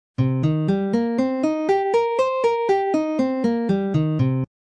c minor seventh arpeggio pattern, guitar

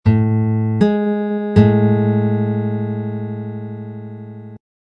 major seventh, guitar

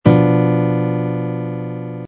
b diminished, guitar triads

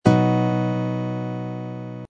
b major barre chord, guitar

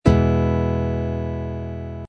e major open chord, guitar

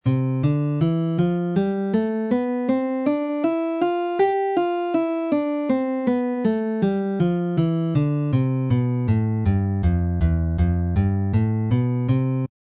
open c major scale, guitar

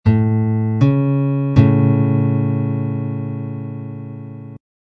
major third

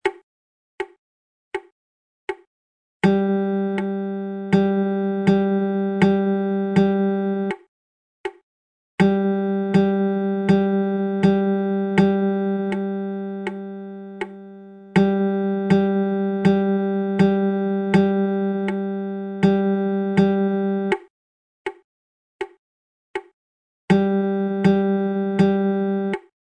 rhythm chart, strumming

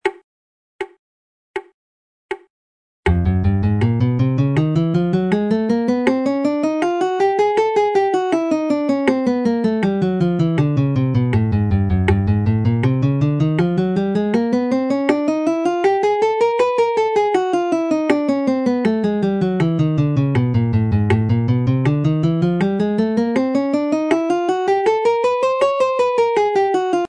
alternate picking exercises, guitar

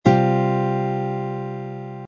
c suspended fourth chord voicing, guitar

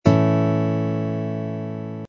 a major open chord, guitar

